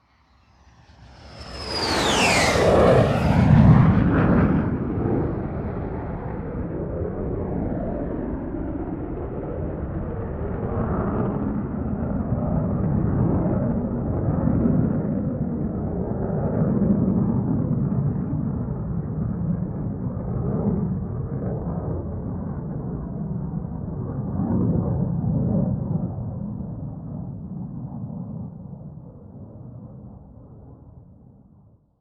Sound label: Airplane Jet Fighter Pass